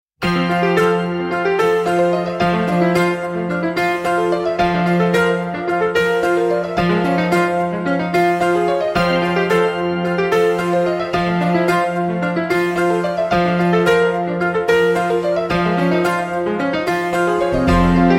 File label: big excitement